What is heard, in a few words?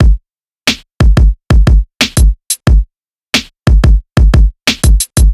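16th c, hip hop beat; сэмплы битов хип хоп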